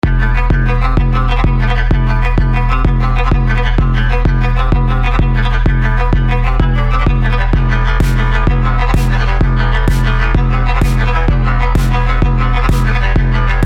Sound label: guitar